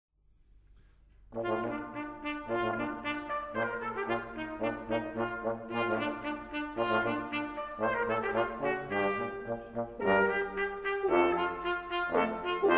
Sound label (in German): Blech; Tuerkischer Marsch; Beethoven